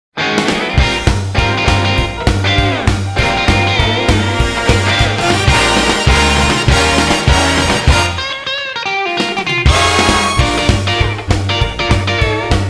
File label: jingle bells instrumental